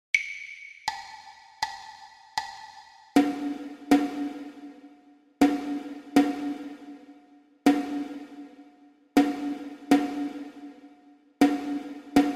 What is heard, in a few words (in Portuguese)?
percepcao ditado ritmico